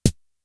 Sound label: Tom, Toms; Fricke Schlagzwerg; drum machines